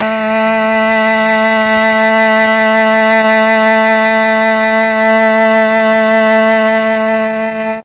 A3 mellotron cello

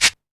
Cabasa, drum machines, Drumtraks

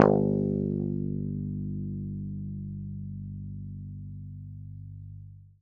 g2 fff, swagbass

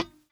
a3 release, glowlybass

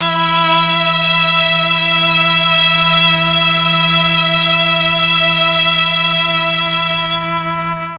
E3 MIXED STR, mellotron; MIXED STRGS

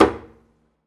pizz noise slap knuckle, double bass

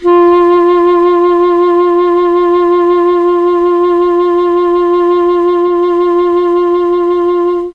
F2 ALTO SAX, mellotron; CHMB ALTOSAX

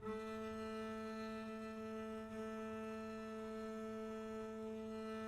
a3 down, arco looped; meatbass

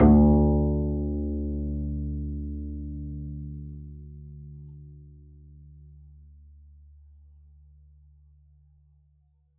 pizz d2, double bass

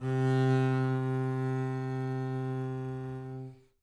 c3 down, bass arco; meatbass

